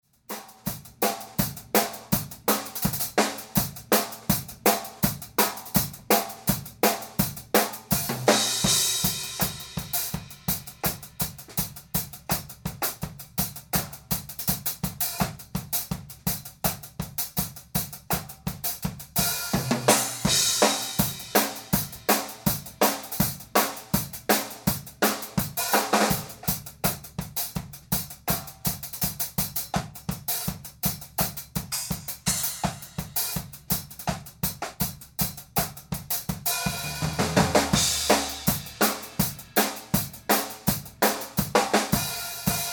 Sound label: Mono Drum Room